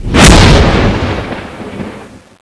charge shoot; cstrike; weapons; gungnir

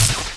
shoot end; cstrike; weapons; gungnir

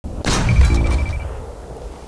Air Drop Landing; cstrike; CS BattleRoyale Sounds

